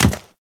Step Default; Warframe; Ballas Chimera

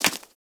Foley Idle Ear Flap; Enemies; Warframe; Catbrow

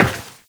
Step Metal, Footsteps, Walk; Warframe; Tenno

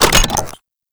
Hack Minigame Mech Action; Warframe; Duviri

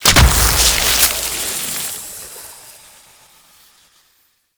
Plant Explosion; Warframe; Duviri; Gardening

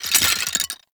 Bone Effigy Destroy; Gameplay; Warframe; Tau; Destructible Dynamic

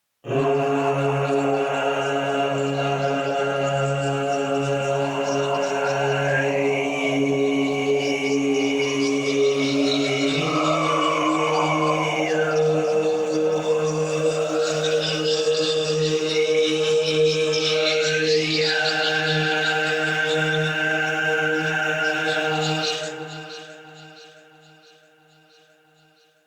Choir; Warframe; Sentients

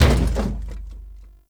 Stadium Floor Tom Destroy; Warframe; Ambience; Nineteen Ninety Nine

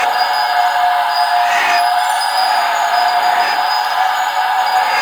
Shackle Proj Loop; Warframe; The New War; Deacons

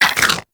Infested Vomit Gun Frenzy Deactivate; Warframe

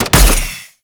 Miter Shot, Weapons; Warframe; Tenno

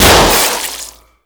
Festivities Balloon Pop; Follie

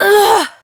Generic Short Pain, Operator Voice

